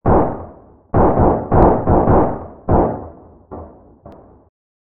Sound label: background gunshots; super space game